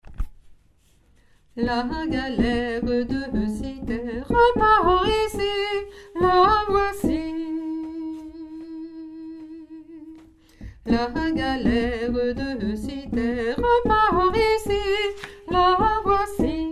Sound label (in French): couplet basse, chorale